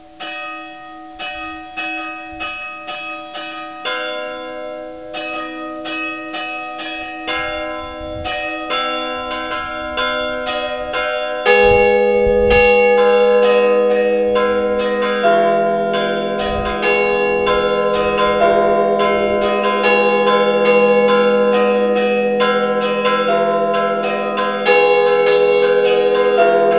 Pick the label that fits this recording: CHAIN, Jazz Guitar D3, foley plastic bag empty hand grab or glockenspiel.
glockenspiel